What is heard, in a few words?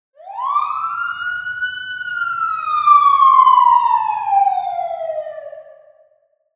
Cities nyc Siren